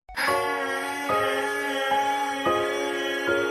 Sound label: crying